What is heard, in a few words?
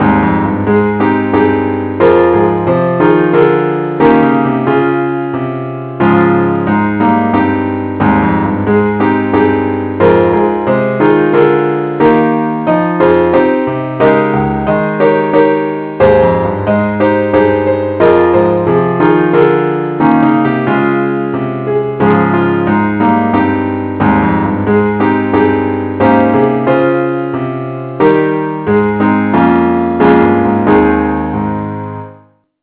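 keyboard only key A flat, A Flat Major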